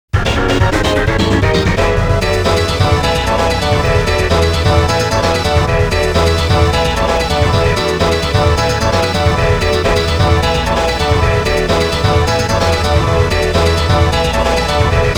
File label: Power Up BGM; Bangai-O Spirits audio collection plus